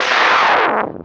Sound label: Sound Magic FREEZE